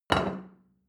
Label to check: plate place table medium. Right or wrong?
Right